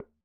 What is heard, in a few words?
Bongo Hi Slp; Percussion